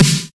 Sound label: Original Steel Snare Drum D Key